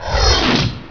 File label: lazer